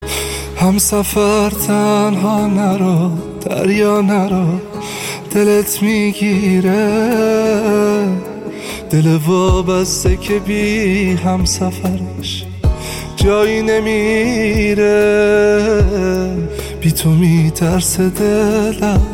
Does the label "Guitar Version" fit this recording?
Yes